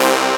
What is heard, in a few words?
Synths Root C